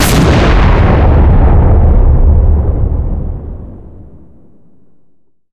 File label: cannon 125mm 2a46 shot; cannons; War Thunder